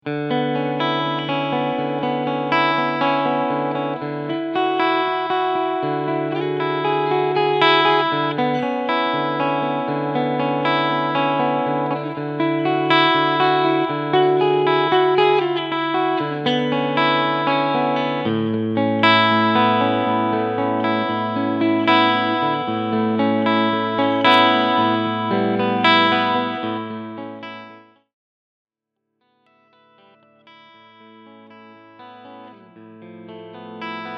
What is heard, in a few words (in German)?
Clean Picking Schalterposition 2; PRS Myles Kennedy